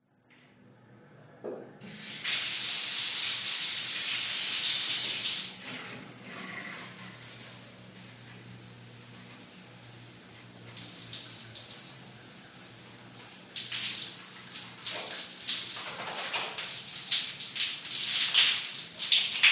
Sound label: household shower bathroom taking shower room pov traffic outside city